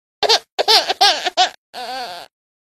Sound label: baby cry short; tre em khoc 1 tieng